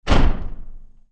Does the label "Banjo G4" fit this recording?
No